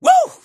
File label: woo